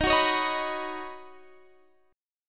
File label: D sharp Minor 7th Sharp 5th, Guitar, Standard; strummed; capo 9